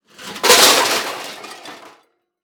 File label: Impact Metal Cans Dumped On Cement Short; Impacts